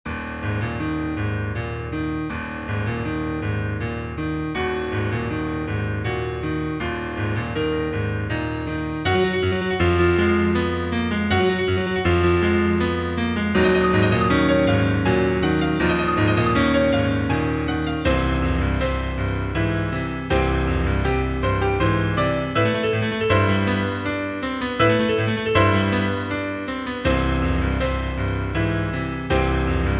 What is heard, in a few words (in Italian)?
rapsodia per due pianoforti